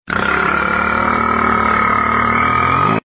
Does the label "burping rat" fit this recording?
Yes